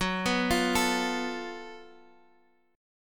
F sharp Minor Major 7th Double Flat 5th, F sharp, chord; arpeggiated; x,x,4,4,6,5; down